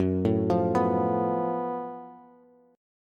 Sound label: Gb Minor Major 11th Gb down Bass Bass Standard; GbmM11 arpeggiated; JGuitar